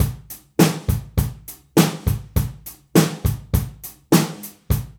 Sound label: DRUMS, Funky Ass Loops